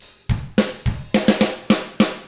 105 POP ROCK, Double Platinum Drums; ILIO

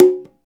PRC, INDIA PERC

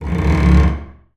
TALK BOW, BASS FINGER; Xsample VOL-5

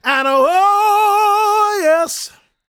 gospel male, vocal; ILIO; Vocal Planet

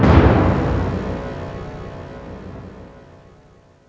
CLASSIC, CLASS.HIT; East West; Global Sounds

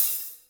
HAT, Hip Hop

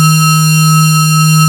BELL C4

